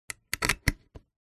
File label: technology quater inch phono lead connector plug in speaker